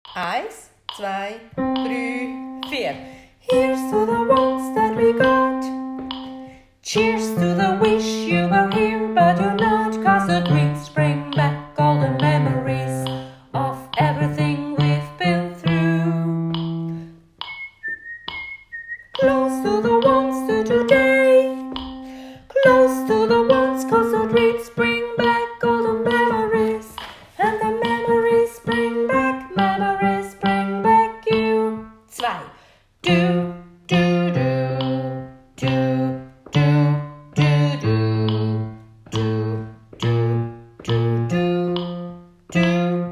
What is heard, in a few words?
Bass